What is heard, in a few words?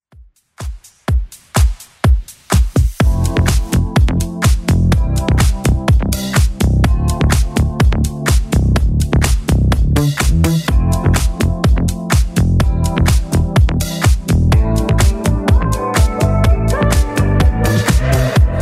Top40 Extend Redrum 125 bpm